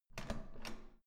door handle use

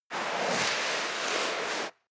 step leather shoes sand slide long; schritte

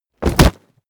body fall concrete leather; krieg-waffen; nahkampf; soundpool

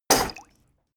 dog drink spit; natur-tiere; hunde

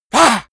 monster hiss; koerpergeraeusche; comedy-cartoon; soundpool

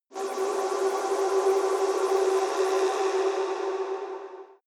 shot shining mysterious lights, swooshes swishes

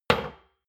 shot glass place table hard, gastronomie; berufe; soundpool

